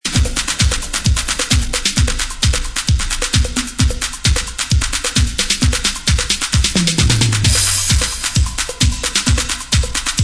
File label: roll the drums